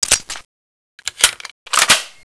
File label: akimbo gun r reload; weapons; ayakashi